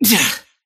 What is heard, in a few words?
deny pain; dota; kez